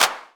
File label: Clap Tear, Drums; One Shots; Ableton Live 12 Lite; Core Library